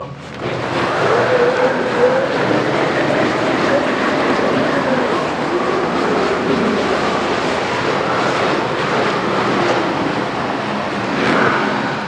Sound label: Storm